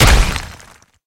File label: click